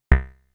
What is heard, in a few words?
Short, synthlead